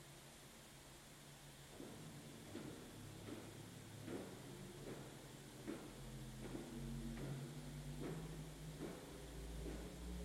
Manuál Bourdon 16', Ukázka zvuku, varhan; I. Manuál

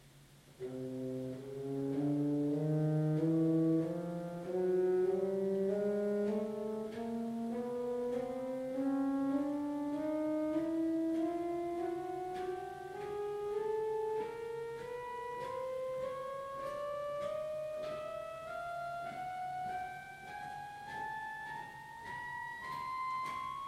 Manuál Fugara 4', Ukázka zvuku, varhan

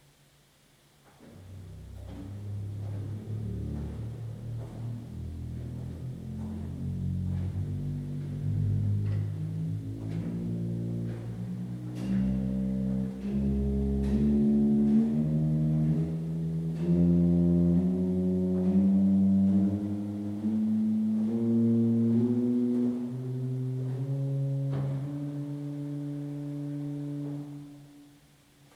Pedál Violonbas 16', Ukázka zvuku, varhan

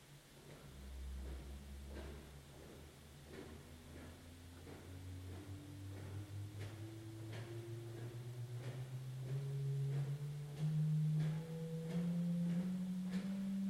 Manuál Kryt líbezný 8', Ukázka zvuku, varhan

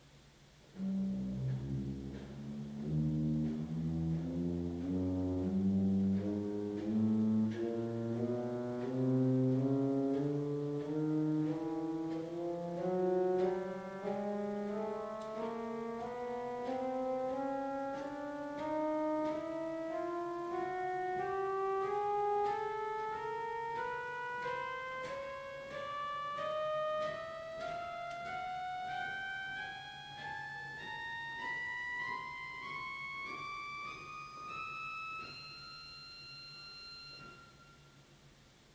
Manuál Principál houslový 8', Ukázka zvuku, varhan

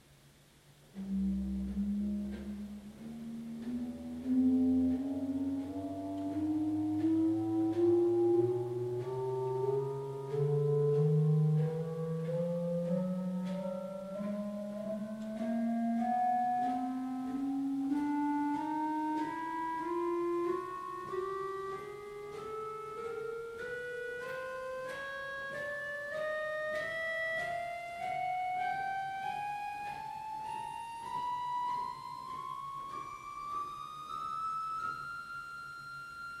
Manuál Klarinet 8', Ukázka zvuku, varhan; II. Manuál

